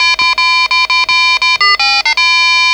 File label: jingle bells short; maximus